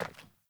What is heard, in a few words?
Sandals Walk Metal, Footsteps